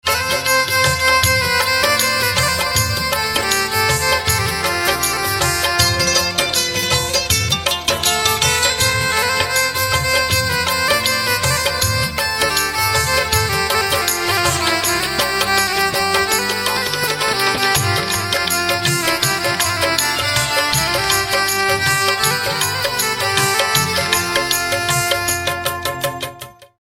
Violin Instrumental Ringtone, chhattisgarhi instrumental ringtone